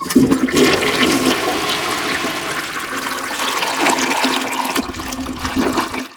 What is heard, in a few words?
toilet flush; ambience